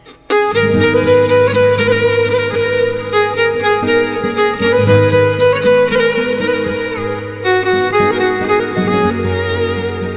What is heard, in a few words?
Mobile Ringtone